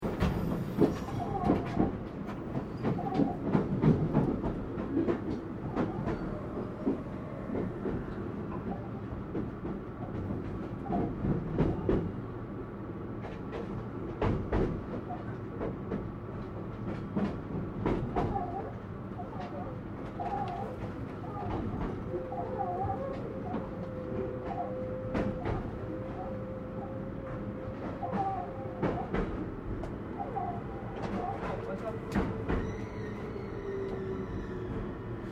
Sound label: Train Aomori Series E731 Express Internal Stopping, Japanese Trains